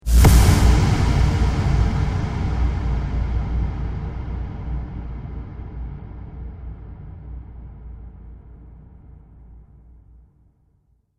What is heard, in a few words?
Cinematic Dark Hits; Sound Design; Hit Sound Effects